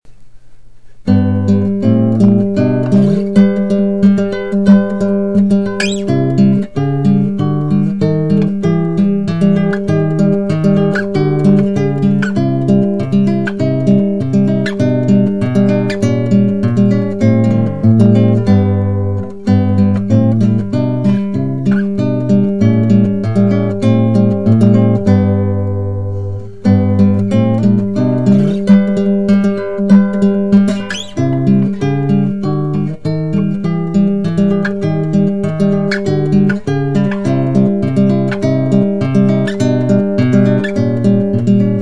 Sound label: classical guitar